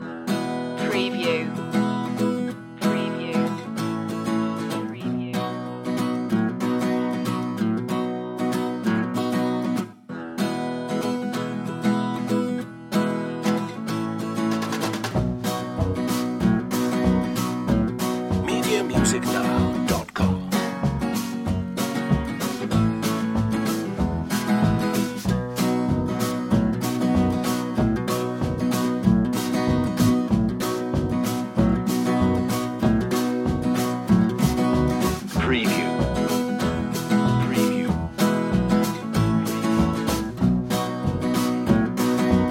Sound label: rhinestone rambler loop